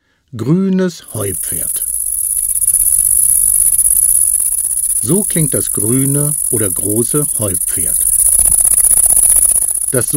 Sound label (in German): Grünes Heupferd